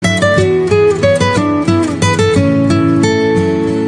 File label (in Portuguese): rington nokia na gitare